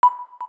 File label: KEY XYLOPHONE 9, keytone